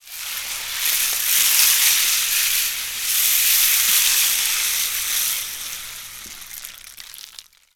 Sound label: RAIN STK, RAINSTIKS; Spectrasonics; Heart of Africa 1